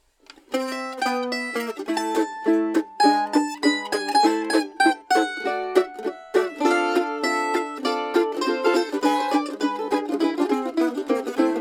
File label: Mandolin FF